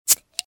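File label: lid against glass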